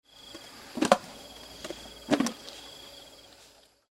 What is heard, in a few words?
ear flap